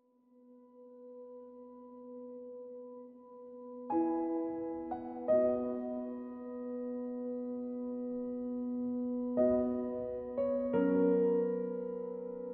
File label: 88bpm 4-4; Planet of Lana